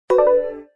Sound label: chat beep